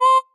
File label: marimba; ゆめ2っき